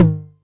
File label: Tom Hi, Novation Drumstation 909